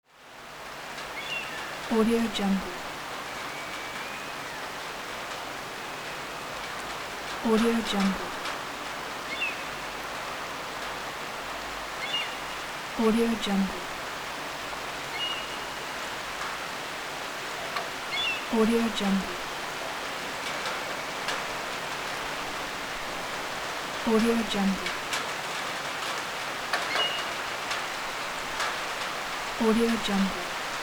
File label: rain outside the window